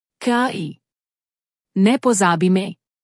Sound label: scream; novafarma